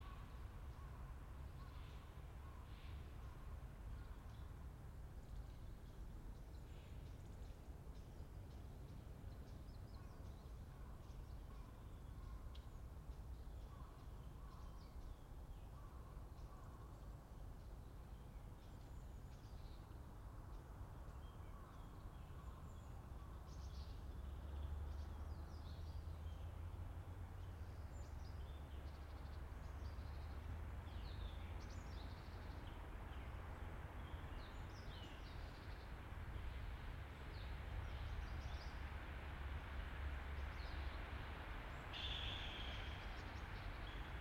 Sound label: yamanakako sound